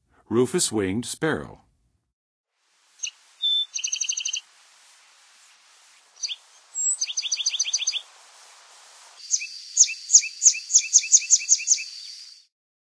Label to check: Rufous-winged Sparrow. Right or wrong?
Right